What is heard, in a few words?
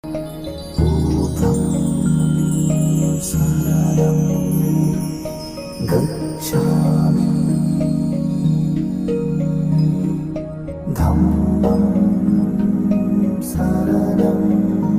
buddha music; namo bhuddhay